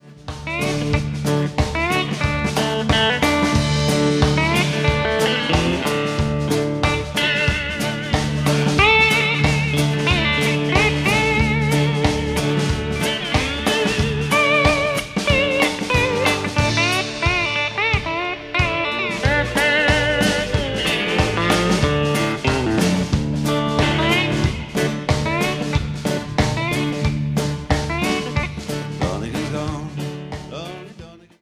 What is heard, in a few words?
Danelectro dc, Live; Berlin 2007